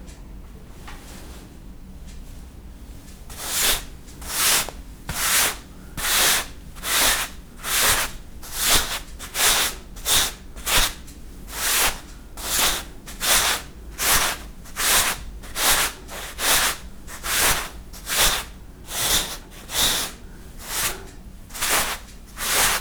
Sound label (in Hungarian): zako kefeles jobbrol, ruhakefe; angyalfold